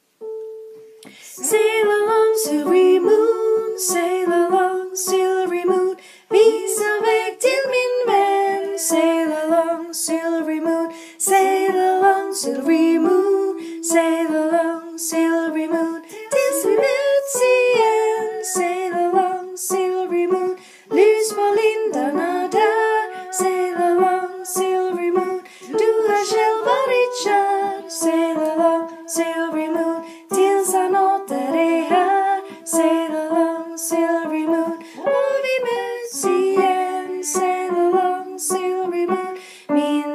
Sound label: SOPRAN; 95 ars jubileum